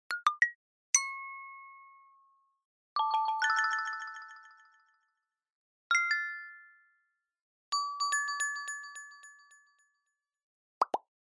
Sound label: Smartphone UI Sound